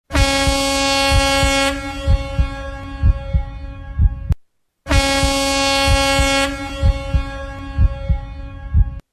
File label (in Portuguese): Efeito Sonoro Buzina de Carret